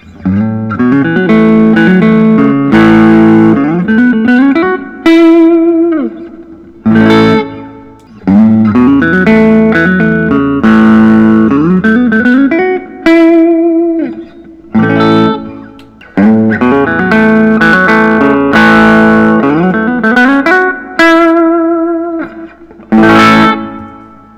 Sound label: collings i 35 deluxe; cherry sunburst